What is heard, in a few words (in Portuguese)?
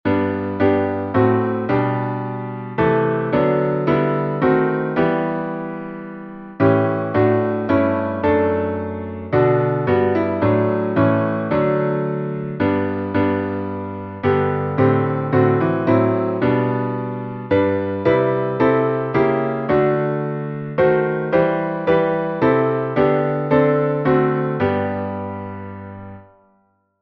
salmo playback